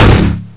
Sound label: dragon fire; weapons; Csowpn